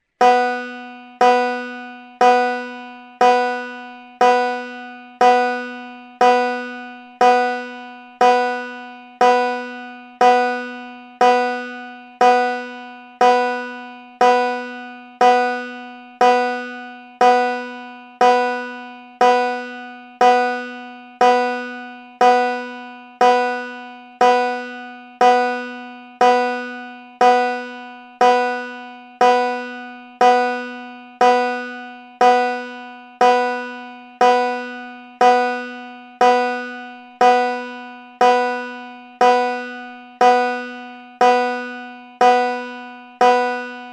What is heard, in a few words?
B3 Banjo